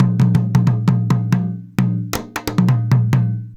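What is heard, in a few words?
junjunba groove; Best Service; World Colours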